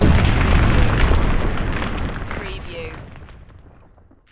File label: Explosion with stone rubble; Action, Impacts & Explosions